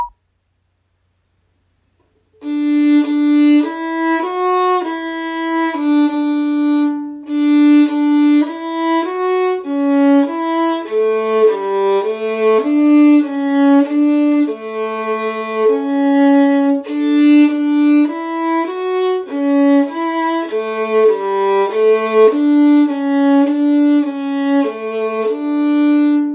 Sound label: Harmony